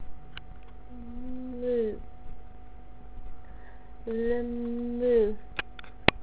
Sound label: Moo